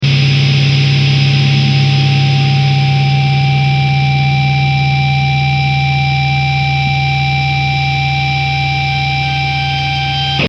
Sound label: feedback